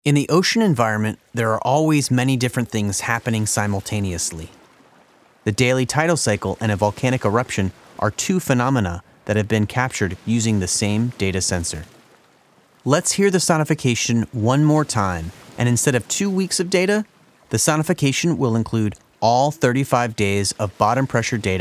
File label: Axial Seamount Eruption Audio Display Track w Ocean Bed